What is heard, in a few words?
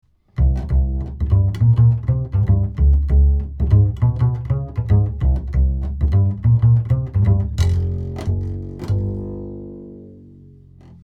Upright Bass 70 cm Bridge cardoid; telefunken tf47